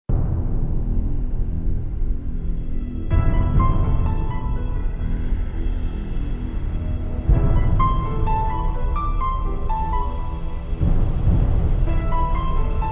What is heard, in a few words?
BGM shot CG